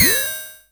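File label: electronic metal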